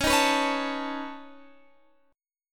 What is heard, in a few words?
C sharp 7th Suspended 2nd Sharp 5th C sharp down